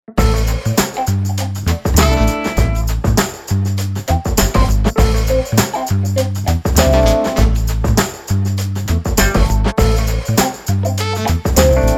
Funky Feet

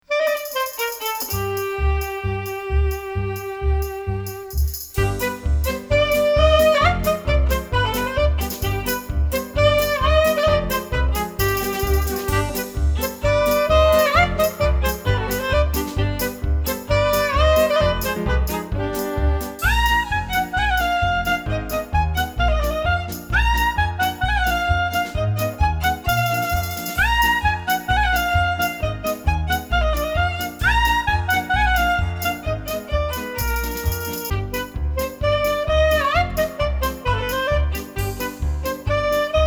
jewish wedding

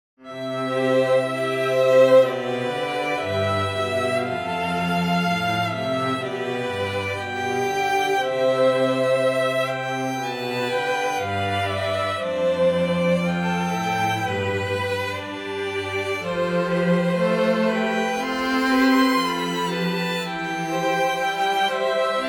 String Quartet

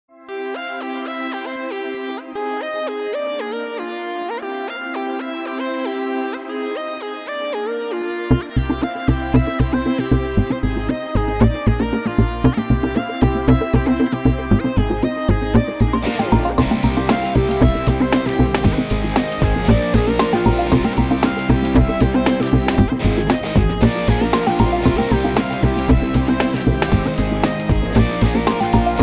Pop Track Med tempo

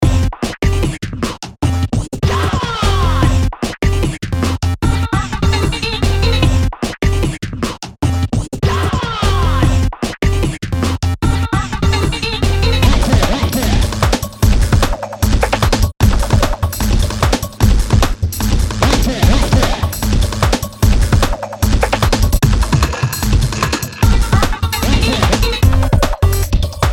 electronica fast

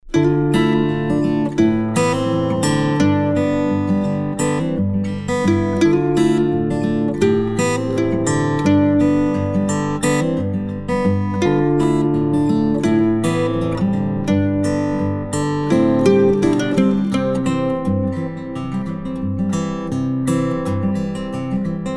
Mountain Lullaby

